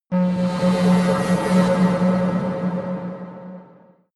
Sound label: space alien spacecraft horning fly; raumschiff